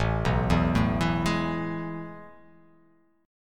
A Major 7th Suspended 2nd, chord

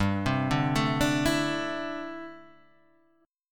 D 9th Suspended 4th, chord

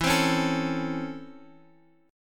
Db Minor Major 7th Sharp 5th E

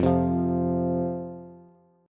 F 5th F, down, Bass; F5 strummed

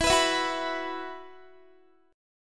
E Suspended 2nd E, Guitar, 4E,4A,5D,5G,5C,6F; strummed; Esus2

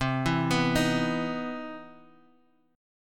C Major 7th Sharp 9th C, chord; CM7#9 arpeggiated; JGuitar

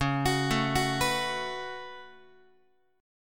Db 7th Suspended 4th Sharp 5th Db down Guitar; JGuitar

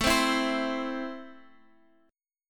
A Minor, Guitar, Drop D

